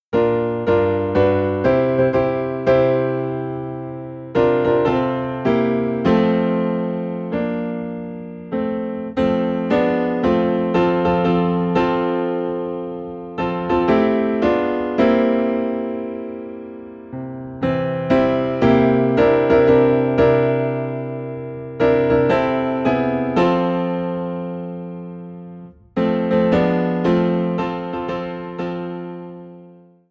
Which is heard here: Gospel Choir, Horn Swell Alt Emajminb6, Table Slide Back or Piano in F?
Piano in F